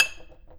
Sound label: PLING, household; AKAI Anime Sound FX